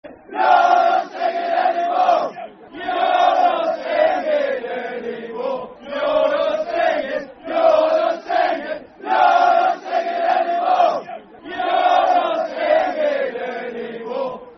You're Not Singing Anymore Football Chant (Soccer Song); Milton Keynes Dons Mkdfc